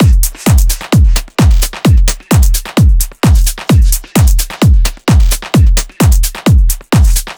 Drum Loop 130, Techno; Ghosthack; Free Techno Sounds 2024

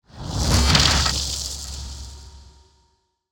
Riser

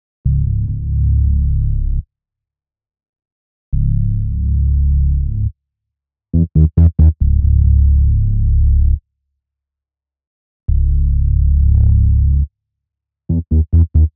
138 BPM C# Min Bass; Loop Stems; Cymatics; 2022 Melody Mystery Expansion

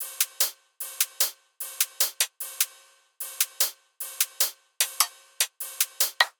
Drum Loop 150 Top; Ghosthack; Color Bass Essentials

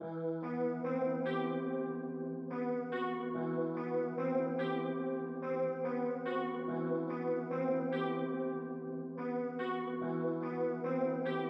144 BPM E Min Piano; Stems; Cymatics; High Ground

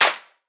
Clap; Cymatics; Light Free Sample Pack; Drum One Shots

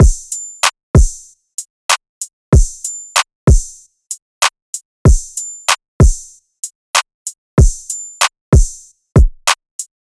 Drum Loop 95 BPM, West Coast; Alameda

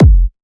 Kick, Techno, One-Shots, Drums

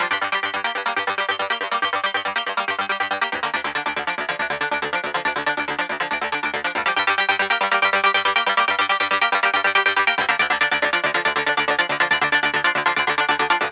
Synth Loops G 140; Trap and Dubstep

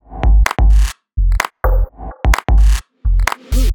Underground Top Loop - 128 BPM F; House; Top Drum Loops; Cymatics

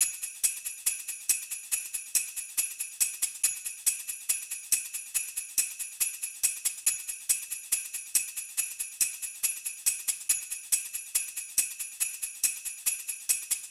Drum Loop 140 BPM Tambourine; Footwork; Experimental Drum Loops; Cymatics; Mystery Pack Vol 4

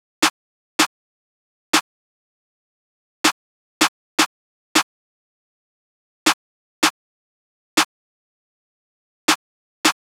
Drum Loop 159 BPM Snare; Cymatics; Mystery Pack Vol 4; Runner

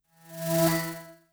Flash

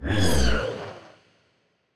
Spaceship FX

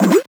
Retro FX; Cymatics; Video Game Sample Pack Vol 1

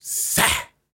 Grunts and Chants, Viking Vocal

